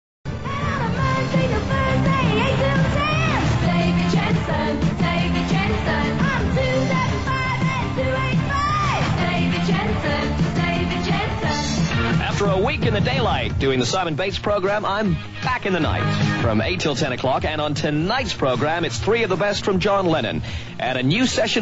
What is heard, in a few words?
jingle; david jensen